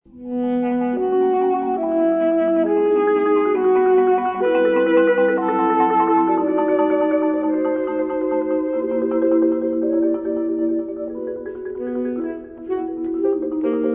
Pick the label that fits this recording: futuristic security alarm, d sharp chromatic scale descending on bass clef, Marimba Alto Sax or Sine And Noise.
Marimba Alto Sax